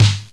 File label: fat snare